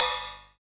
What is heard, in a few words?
crash cymbal sound f key